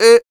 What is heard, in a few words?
FEM PERC, Vocal Jazz FX